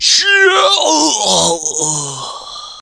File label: die; super trench attack 2